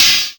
Open Hihats, Cymbals; Vengeance Samples

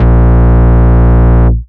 Root A#, Ultimate Bass; Vengeance Samples

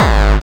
BD Distortion, Bassdrums; Vengeance Samples

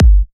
Bassdrums; Vengeance Samples

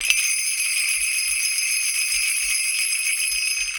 prc, percussion mix; PS-28 Vocal House Samples; Drum & Perc

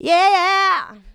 vocal house adlibs; PS-28 Vocal House Samples

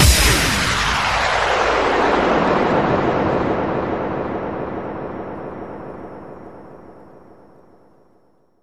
Downlifter

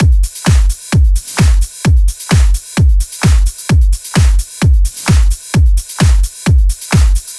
128BPM Drums; Vengeance Samples; Face to Face Kit

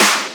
Claps

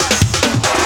Loops Fills; Vengeance Samples

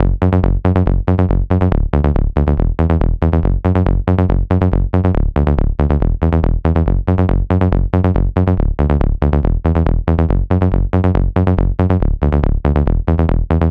Synth Euro Bass, 128 BPM; Rap; Vengeance Samples; Vocal-Hook Kits; Song Kit

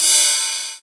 Cymbals Ride; Vengeance Samples

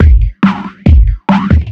Breakbeat; Vengeance Samples; VEC2 Loops

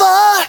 Vocal Oneshots; Vengeance Samples; Vocals

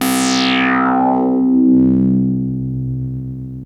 Arp E C2, ARP Odyssey; musicradar-synth-samples

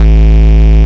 Bass Root A

